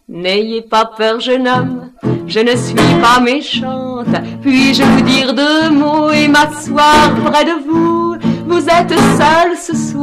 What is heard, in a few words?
tango